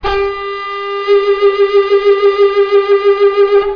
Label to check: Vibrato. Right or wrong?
Right